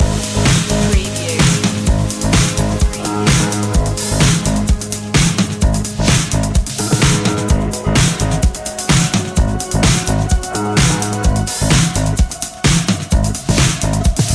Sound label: robo-disco loop